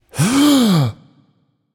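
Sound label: non verbal breath, voice; cernunnos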